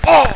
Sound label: fall; quake2